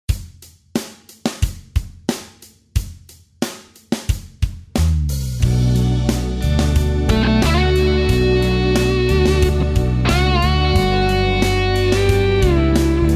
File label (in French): lounge ambiance en bm